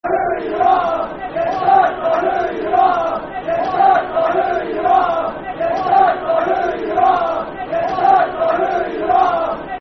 You Don't Know Who You Are Football Chant (Soccer Song); Partick Thistle Ptfc